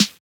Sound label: DR110 Snare Modulated Hit